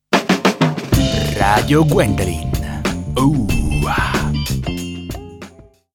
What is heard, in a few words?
Jingle; Radio Gwen ouaaa